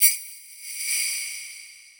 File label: EQ Perc, Percussion Mix